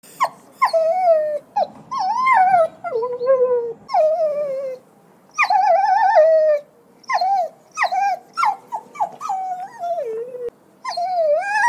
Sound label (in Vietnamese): tieng cho con keu khoc ang ang